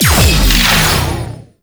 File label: Overguard Depleted; Warframe; Eximus